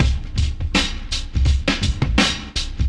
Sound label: Drum loops, Boombap